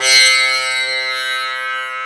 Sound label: SITAR, ETHNIC; AKAI S-Series CD-ROM Sound Library VOL-8; SET#1 ETHNIC